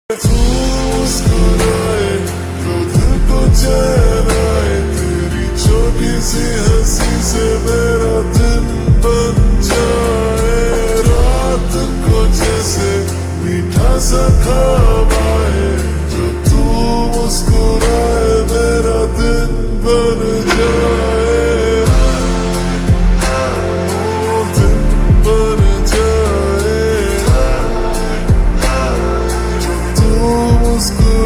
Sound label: Ringtone slowed reverb